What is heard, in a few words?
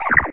idle, roller mine; hl2